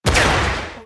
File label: impulse grenade